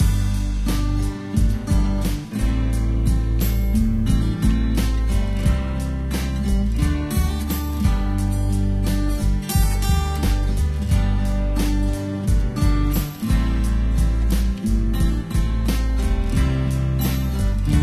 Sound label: Slow Music; android